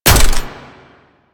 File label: Mech C; Warframe; Zylok Prime